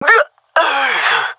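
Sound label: death, female; vo; Counter Strike Model Pack; CS:GO; seal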